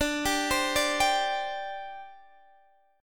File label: G Suspended 4th D